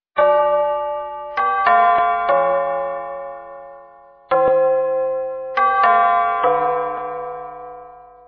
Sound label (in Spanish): campanas tubulares